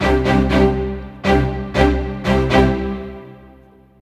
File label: Stacc Strings, rock alternative